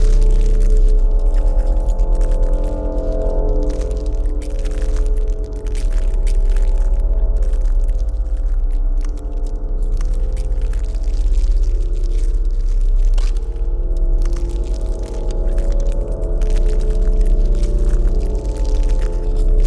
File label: pro ship science station idle; biogenesis